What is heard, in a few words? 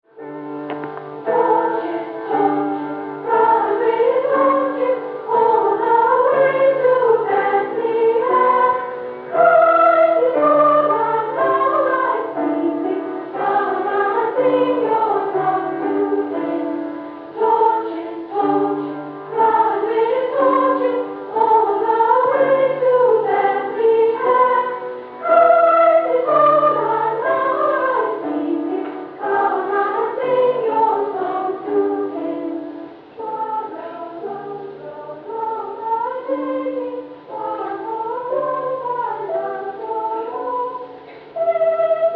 choir and solo verse 2 piano; Christmas; Torches Torches